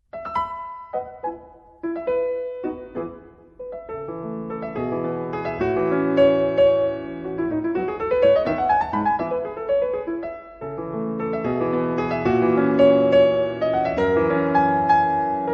Scherzo, Konzertpianistin; Gade

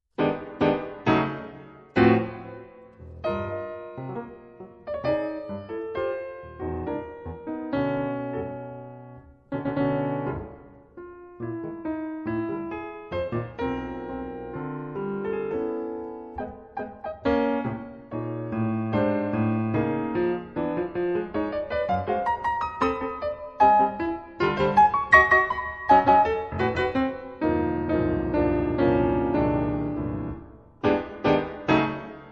Minuetto, Konzertpianistin; Skalkottas; Suite Nr.3

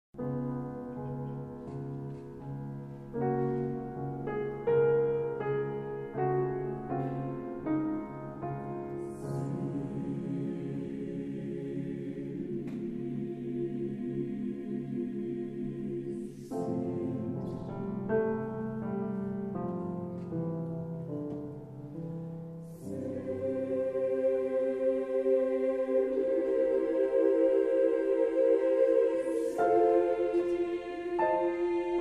Fassung für Chor und Klavier zu vier Händen; Trinitatiskirche Köln; Johannes Brahms; Ein deutsches Requiem - op. 45; 1. Selig sind, die da Leid tragen...

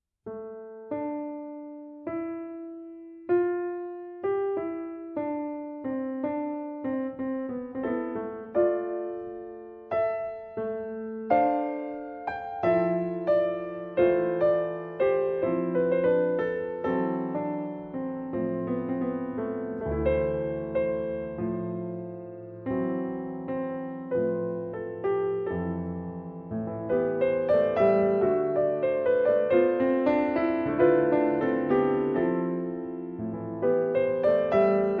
Andante sostenuto, Konzertpianistin; Constantinidis; Dodekanes

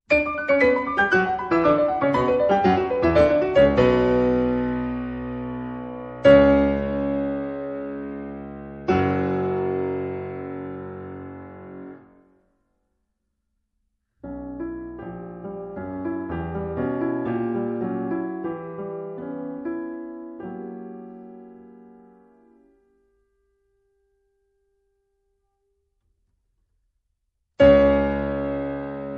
Allegro, Konzertpianistin; Schumann

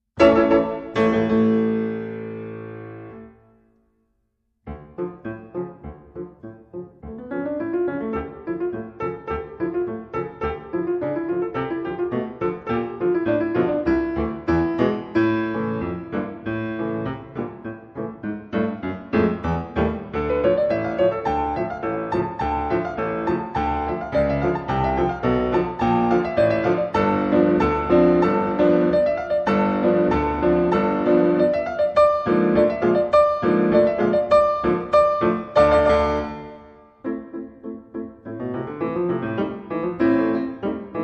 allegro marcato, Konzertpianistin; Grieg

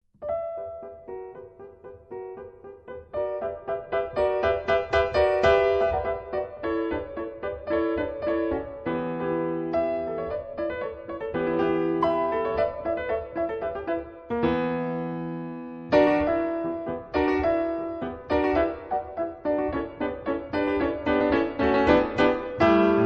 Scherzino, Konzertpianistin; Constantinidis; Dodekanes 2

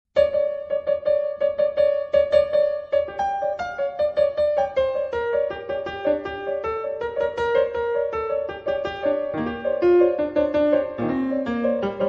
allegro molto, Konzertpianistin; Bartok

